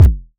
modular deep, Kickbank; Ableton Foundation FreePacks

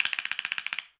Castanet C Roll; Latin Percussion; Drum Hits; Wood; Ableton; Factory Packs

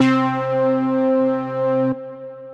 Obee 12 Voice Brass; Ableton; Factory Packs; Chop and Swing

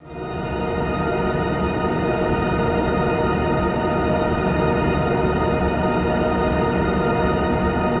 Pad, Synth; The Forge by Hecq; Ableton; Factory Packs

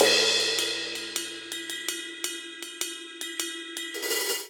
Straight Six Rock Cymbals Only 6/8 80 bpm; Ableton; Drum Booth

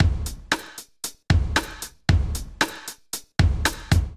groove 115bpm, drum loops; Neon Midnight; Ableton; Singularities

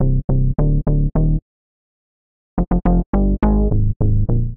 Keys Of Life Bass (Cm) 105bpm; Music Loops; Ableton; Singularities

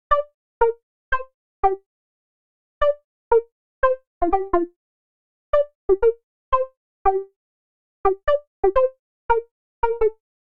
Pizza Pluck G Minor 88bpm; MIDI Clips; Melodic; Please; Ableton; Factory Packs; Chop and Swing

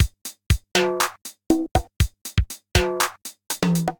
505 Classic Kit, Drum Machines

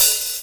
Open HiHat; Ableton; Factory Packs; Retro Synths; Vector; Components; Vector Attacks

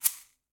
Shaker

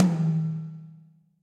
Tom High DarkPlateVerb; Drum Hits; Ableton; Factory Packs; Session Drums Club; MPE Hits

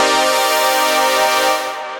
Pillow Chord Stab, Synth Pad; Ableton; Glitch and Wash

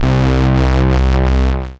Thick Bass; Basses; Ableton; Creative Extensions

